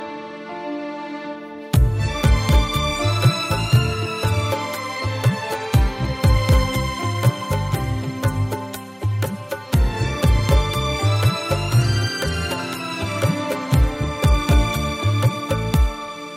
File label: hindi music ringtone